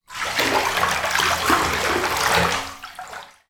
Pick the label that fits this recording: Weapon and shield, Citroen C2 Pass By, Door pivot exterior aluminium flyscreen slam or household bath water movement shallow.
household bath water movement shallow